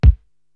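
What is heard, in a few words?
BD, bass drum, drum machine; Korg TR-Rack; Sluggo 1b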